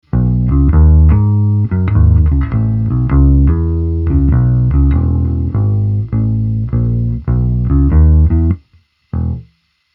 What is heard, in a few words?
Epiphone Bass Neck